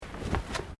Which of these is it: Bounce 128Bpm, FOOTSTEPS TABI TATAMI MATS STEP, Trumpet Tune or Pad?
FOOTSTEPS TABI TATAMI MATS STEP